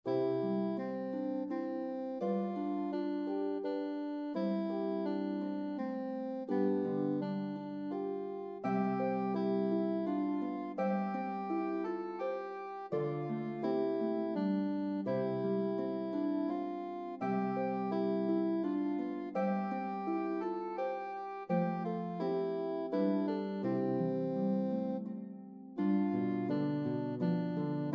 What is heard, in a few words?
Harp and Melody Instrument Soprano Saxophone